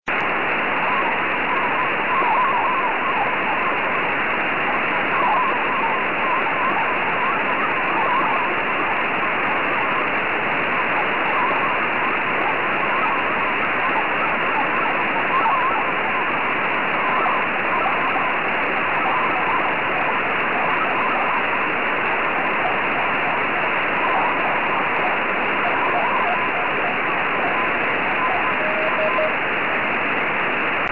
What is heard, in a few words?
SSTV